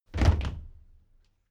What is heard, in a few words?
step military march stop